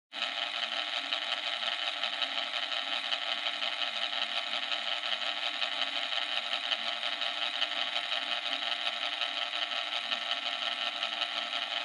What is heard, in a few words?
Outlet Timer Operating Noise; タイマー機器